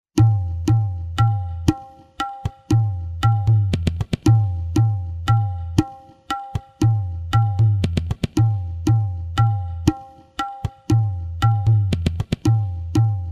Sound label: dhumali, tala